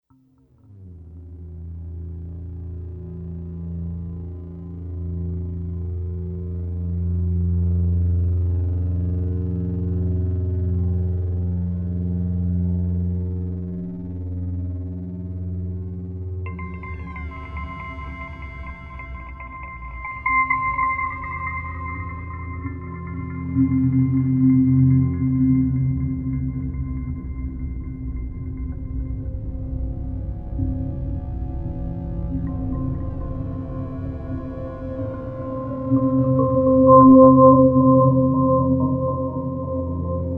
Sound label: Terror Drone; Best Of N2O Library; Drones & Foundations